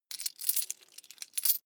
coins clatter medium

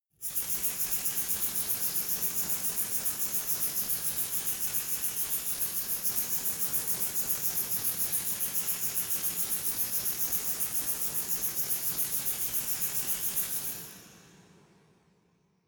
resonator rattle slow

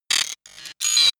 sci-fi electromechanics small stutter evil

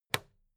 plastic clasp close soft

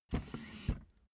office chair hydraulics down

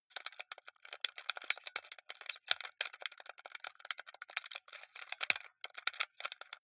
console controller xbox play sequence hectical

